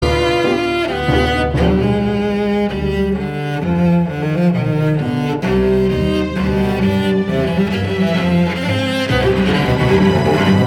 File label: Classical